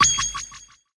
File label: initial win attention; koi princess